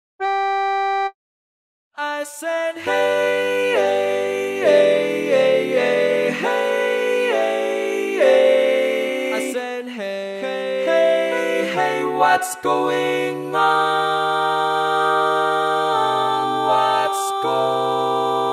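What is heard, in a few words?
Baritone, barbershop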